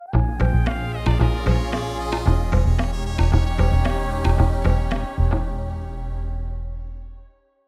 death; donkey kong country 2 fan remastered; Forest Interlude

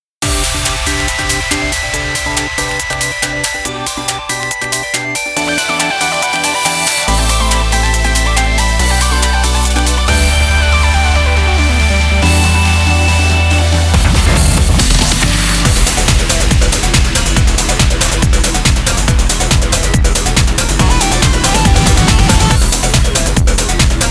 twinkle noise; jubeat prop

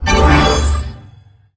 Tile Successful Selection

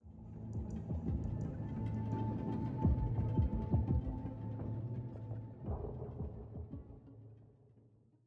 85bpm; Legends of Pegasus; windows gamerip 2012

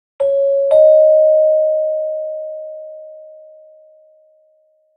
Marimba

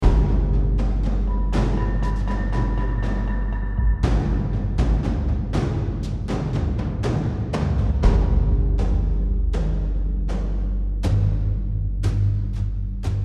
Tension Combat Alternate

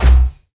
Footsteps; SFX Character

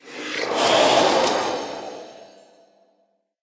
Breath; Hero Jigou

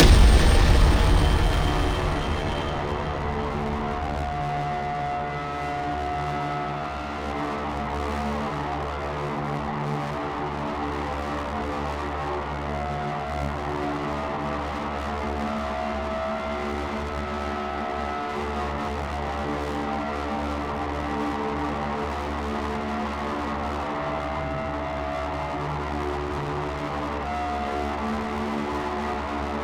Wavegun Blast

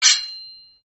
skill 1 sound; Caravan Beast

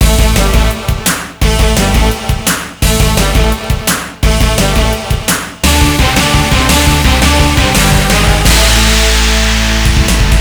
you lose; Cyber Speedway; Gran Chaser

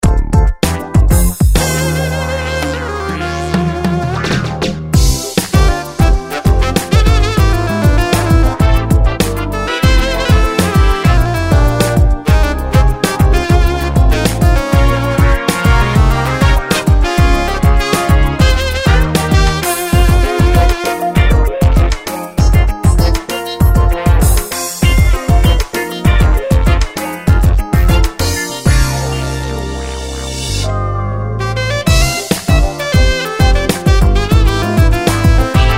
Tavern; Seal Online

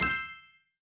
Combo Sound; candy crush jelly saga gamerip

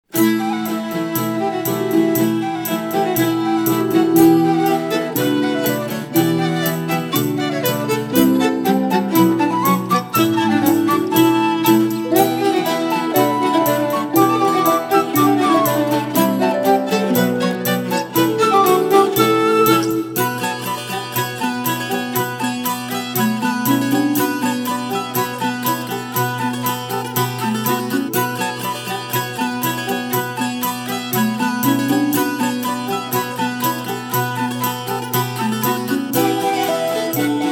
Tavern; Two Worlds 2

